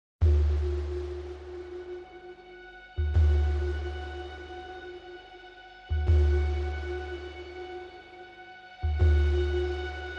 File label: No Arpeggio No Clicks